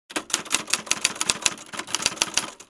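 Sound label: TYPEWRITER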